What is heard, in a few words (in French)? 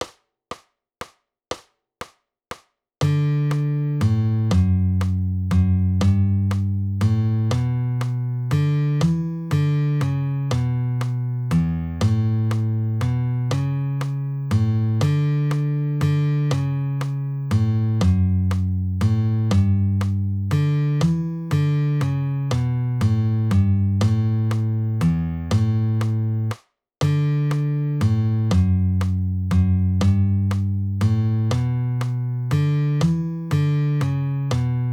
gigue, guitare